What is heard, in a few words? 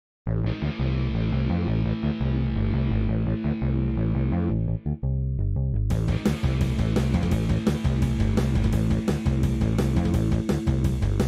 guitar